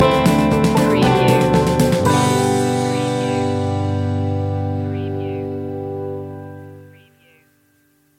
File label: gentle rain stinger